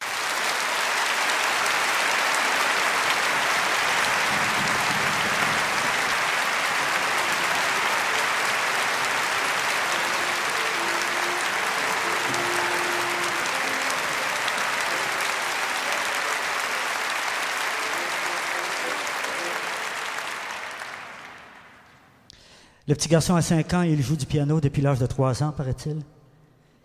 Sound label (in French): Applaudissements Et Présentation